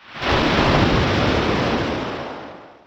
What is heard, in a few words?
Crash Surf Deeper; nvidia gameworks; waveworks